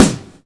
snare one shot c sharp key, Loudest frequency: 1637Hz